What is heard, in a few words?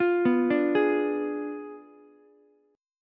D Diminished 7th D down Ukulele; Ddim7 arpeggiated; JGuitar